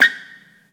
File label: Shoe Sole Rubber Squeak Tile Hall